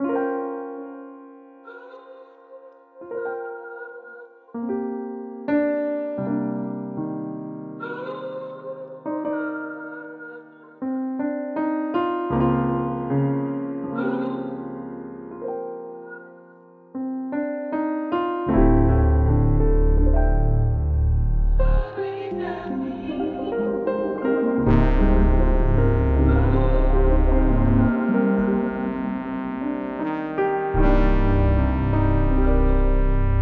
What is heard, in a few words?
Solo Interlude